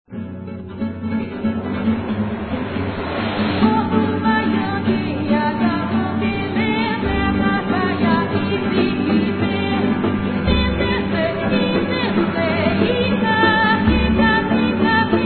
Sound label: vocal, chanteuses; Découvrir les brésiliennes